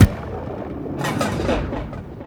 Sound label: Kaos FX, Ambient Grooves